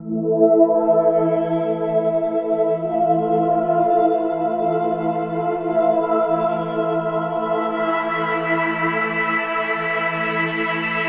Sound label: Melody, Drum n Bass; KJ Sawka; Drum n Bass & Beyond